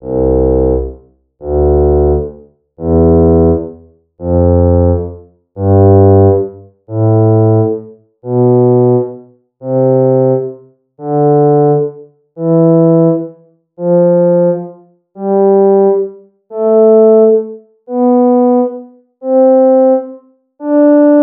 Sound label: bassoon